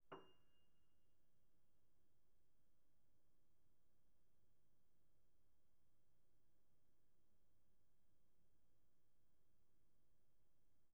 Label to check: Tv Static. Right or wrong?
Wrong